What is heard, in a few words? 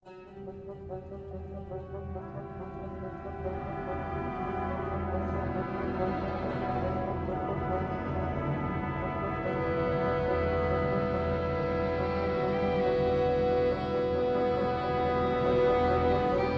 Combat Loop